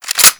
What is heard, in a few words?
reload mag in; minecraft